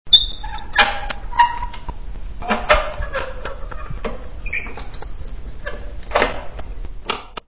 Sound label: squeak